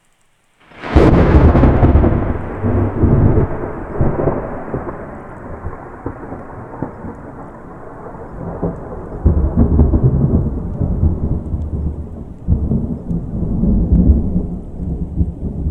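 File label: a single clean thunderclap; environmental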